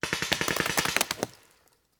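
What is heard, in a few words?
Paintball Gun Impact; Sound Ideas; Guns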